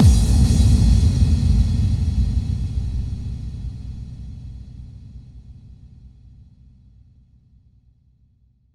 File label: bassdrum reverb FX kick; Vengeance Samples